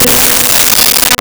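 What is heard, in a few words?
Nylon String Guitar B4; Nintendo; July 2020 Gigaleak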